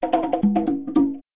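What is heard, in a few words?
drums synth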